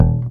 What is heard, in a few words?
bass 3 (E) (mute); Sonic Foundry (Sony Creative Software); Ugly Remnants VOL-2; Basstuff